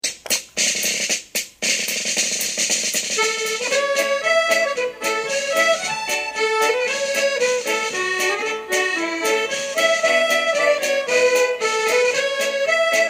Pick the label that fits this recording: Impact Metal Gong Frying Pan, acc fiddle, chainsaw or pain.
acc fiddle